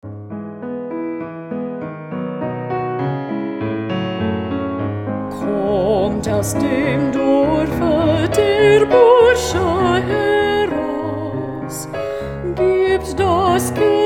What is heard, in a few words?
alto